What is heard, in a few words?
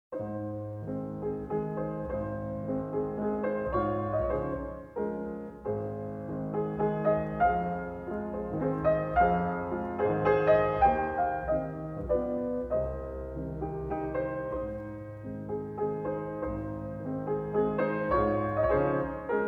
Waltz In A Flat Major Op.39 No.15; Brahms